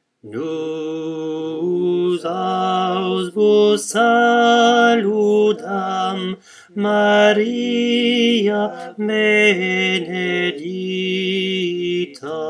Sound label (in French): baryton; ave maria de bareges